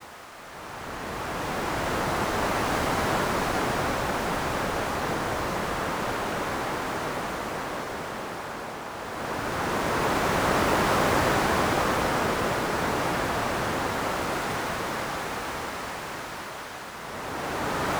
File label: Waves Loop Less Hiss; nvidia gameworks; waveworks